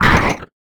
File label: damage; Barotrauma; Barotraumatic; NightmareCreatures; StationCreatures; Husksprinterhumanold